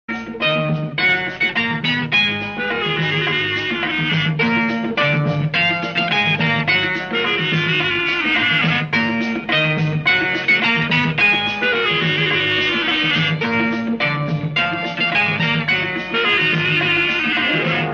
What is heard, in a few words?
old song ringtone old music ringtone instrumental old song ringtone hindi